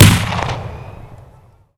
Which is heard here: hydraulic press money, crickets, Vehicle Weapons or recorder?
Vehicle Weapons